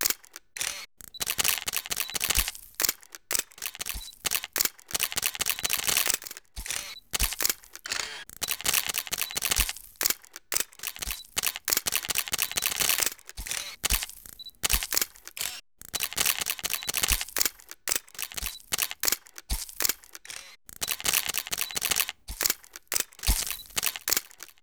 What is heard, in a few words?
cameras